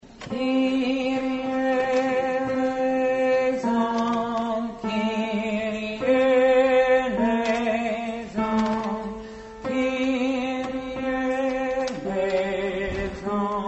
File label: kyrie eleison bass